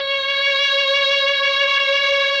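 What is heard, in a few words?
MELLOSTR, Mellotron Violins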